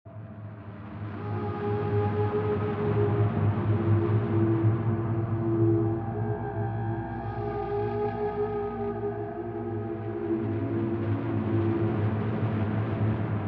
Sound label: ambiance